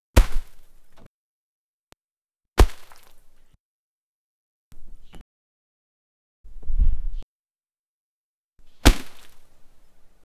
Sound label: shuriken throwing